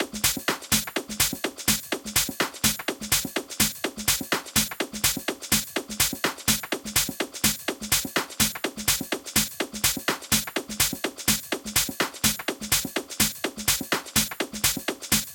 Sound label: 125, toploops